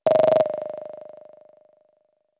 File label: delicate like sand paper; sound effects